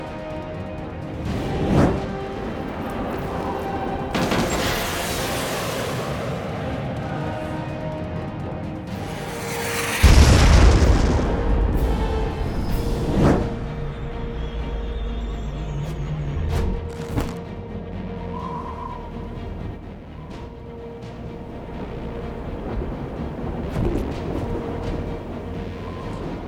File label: Midtro1 Sfx; Castle von doom